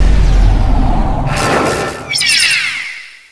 tank stealth stop

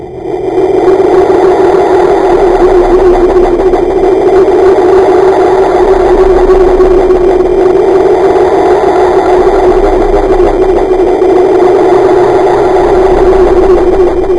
ufo; yuris ufo

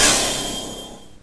laser end; laser gatling

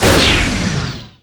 bomb

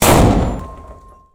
large mortar

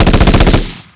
machine gun

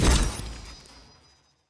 dissolver

